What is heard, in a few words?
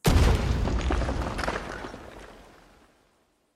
explode